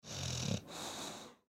Dog Boston Terrier, Dog Boston Terrier Snore Rumble Breathe Out; Animal; Dog Sound Effects